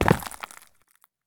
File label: landing glass; Escape from Tarkov